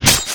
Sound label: Knife Shell, Damage